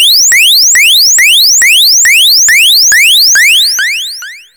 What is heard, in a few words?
OSCILLATE, OSCILLATION; Zero-G Ambient 1